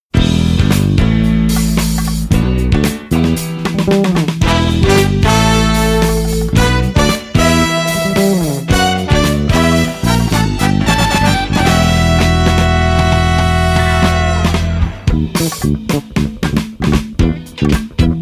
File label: funky Korean soul